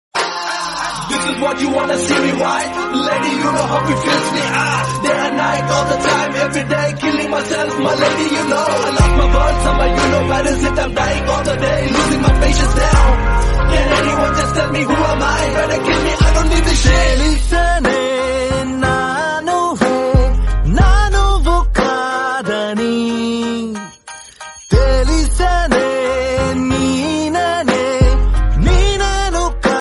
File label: breakup ringtone bgm ringtone; arjun reddy